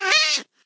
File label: hit, cat; mob; minecraft